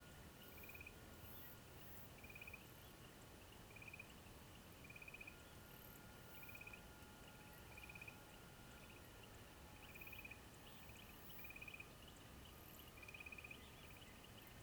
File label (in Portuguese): Ambiencia corrego a noite com grilos e cigarras; sao joao dalianca